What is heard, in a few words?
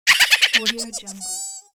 cartoon squirrel laugh